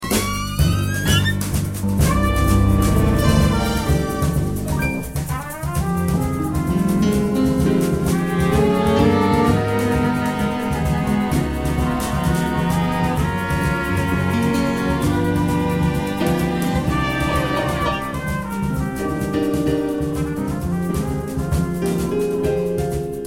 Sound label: Samba